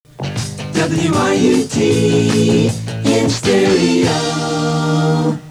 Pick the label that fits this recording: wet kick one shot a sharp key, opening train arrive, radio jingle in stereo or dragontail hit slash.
radio jingle in stereo